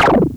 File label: Filtered Snare, MultiMoog